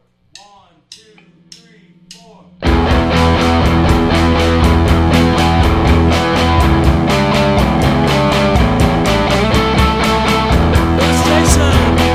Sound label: vocal